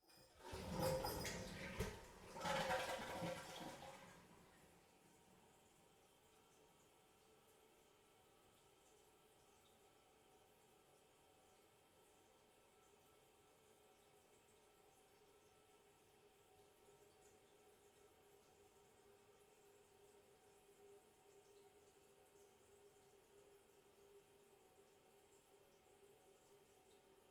toliet flush far

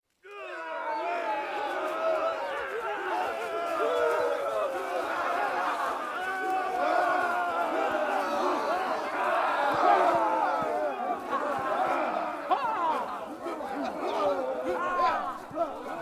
battle intimidation forest, crowds

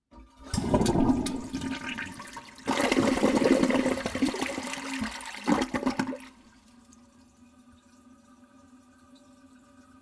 toliet flush very close

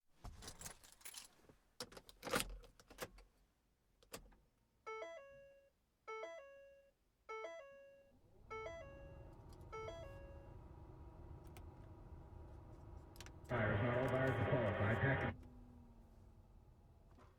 getting into car with radio

